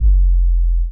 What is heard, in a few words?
Bass Drop, 808; Street Anthems Sound Kit